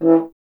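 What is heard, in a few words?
brass French horn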